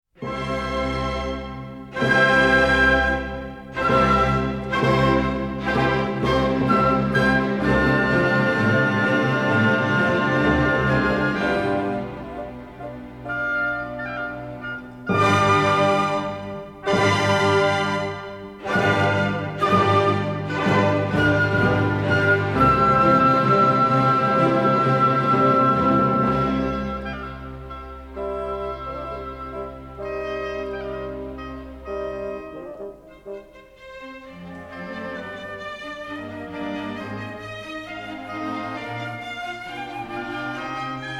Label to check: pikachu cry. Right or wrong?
Wrong